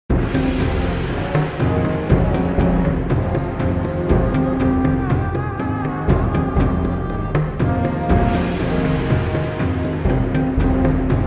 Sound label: Lose; heroes of the pacific ps2 windows xbox gamerip 2005